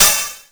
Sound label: DRM; Best Service ProSamples vol.55 - Retro Sampler; AKAI; EMU SP12+120